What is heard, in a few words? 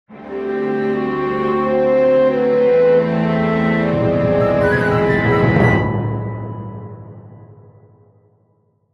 workshop menumusic; Might and Mayhem